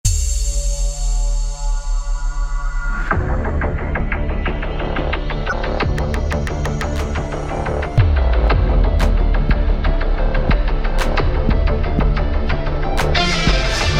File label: Trap